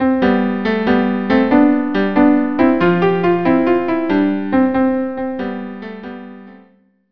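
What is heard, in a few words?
cello, cello; row well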